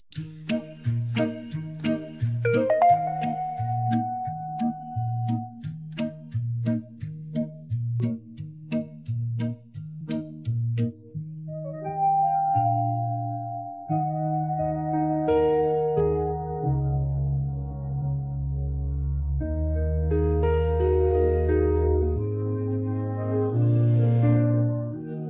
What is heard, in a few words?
pristan instrumental